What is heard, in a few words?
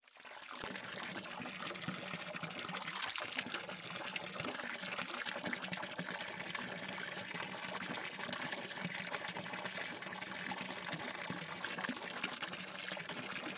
Liquid Water Filling Up Pool